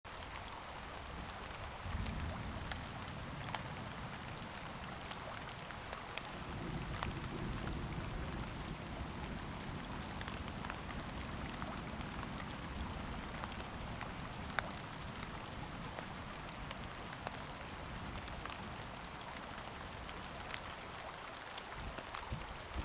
thunderstorm

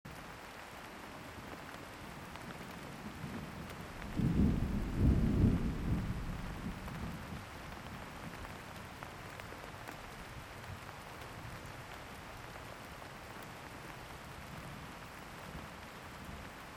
thunderstorm

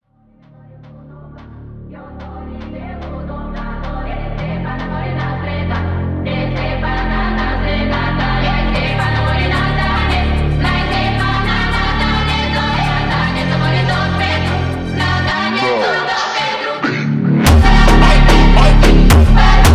funk

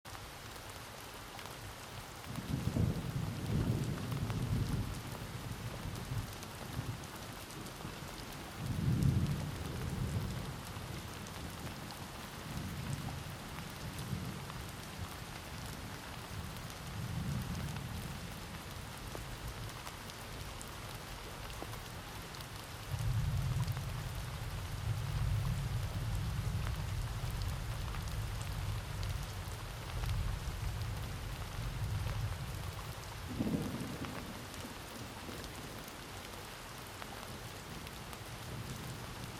innerpeace thunderstorm

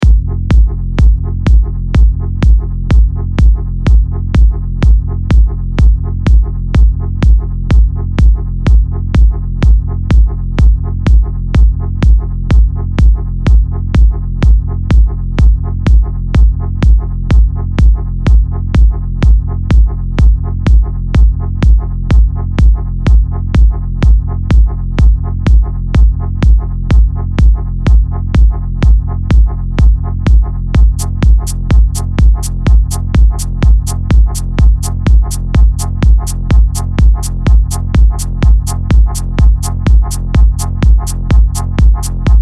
purple noise

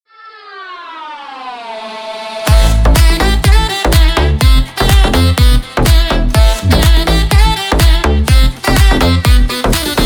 sax remix; russian

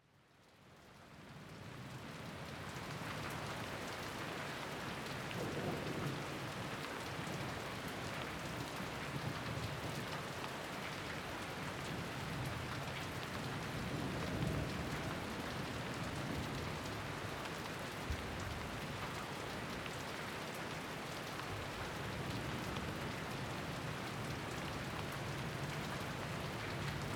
thunderstorm

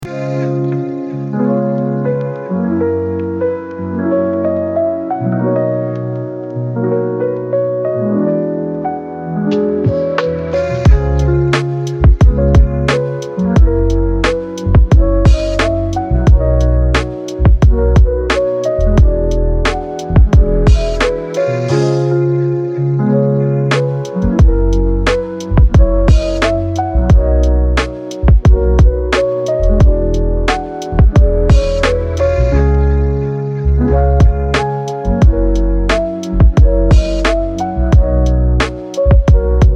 lo fi hip hop lo fi beats

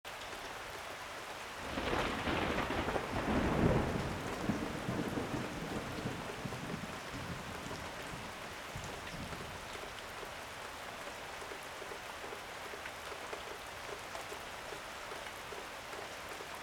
thunderstorm

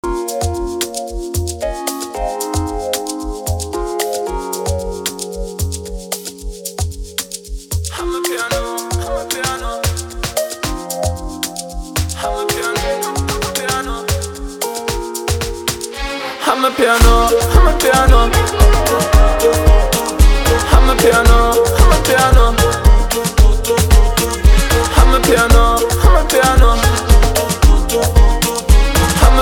amapiano; russian

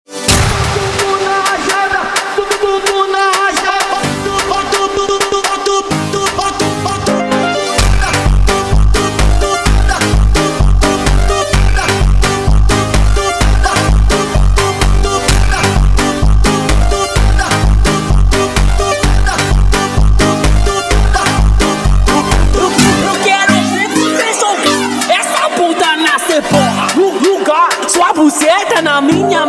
ASTRAL FUNK

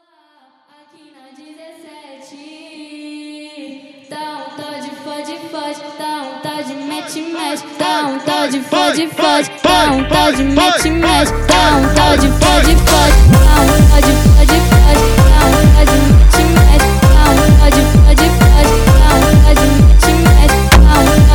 phonk

